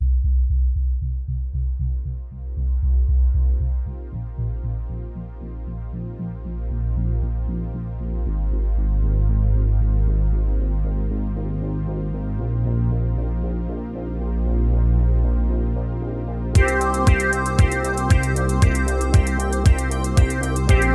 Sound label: Bass Arp Mix